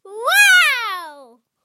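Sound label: child's wow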